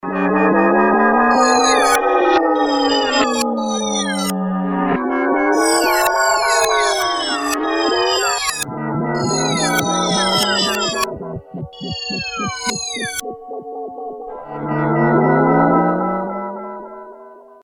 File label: fx, Emu Planet Phatt; a Motorola MC 68000 CPU (mc68hc00fn10) system handles up to 32 voices of polyphony